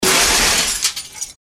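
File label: glass break crash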